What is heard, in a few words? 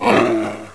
Wolf Struck; Knight Online